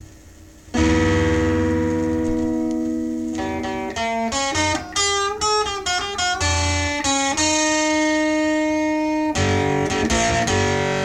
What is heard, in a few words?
MXR Distortion